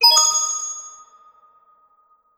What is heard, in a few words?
coin get; tf